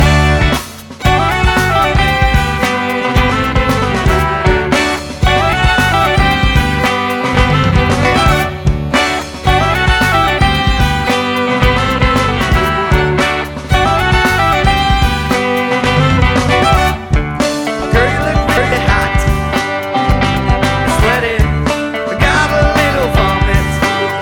creep funk

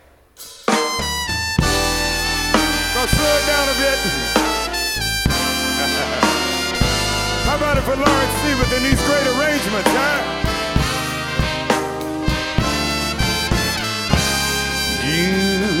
jazz blues

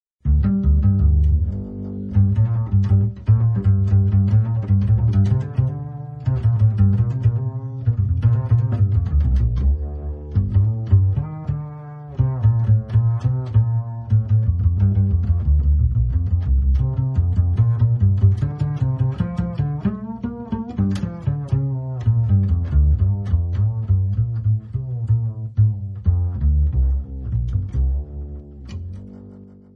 donkey beat

